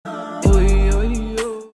oy oy yyoo

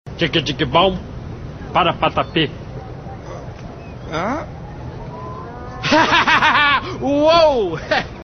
hahaha uooooow; piratas do carilha

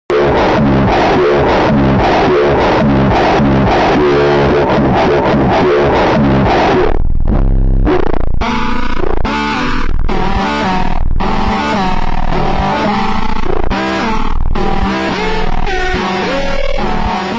phonk

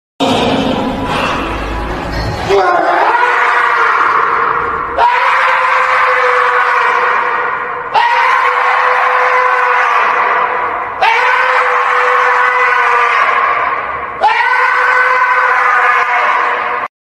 raaaagh

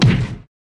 punch sound; henry stickmin

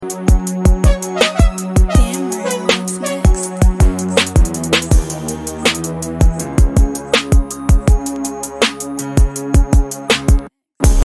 instrumental

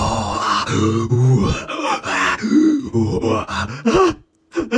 goofy breathing

